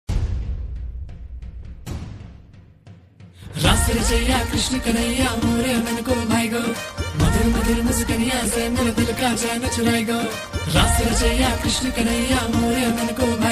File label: bhajan, Sankirtan Ringtone; Janmashtami Special